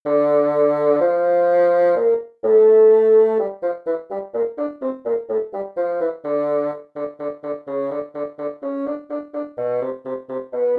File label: Bassoon fast; Region 23 Middle School Etudes for 2026-27 (Year C)